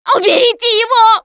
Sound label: scream while pounced, russian, Survivor Voice Producer